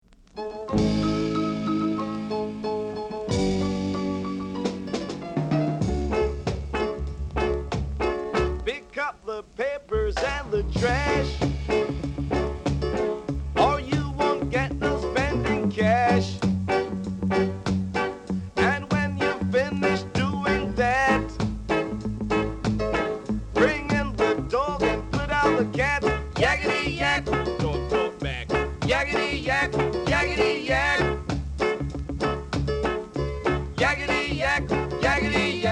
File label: drum